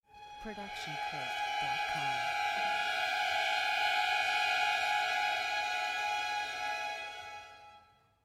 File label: strings dissonance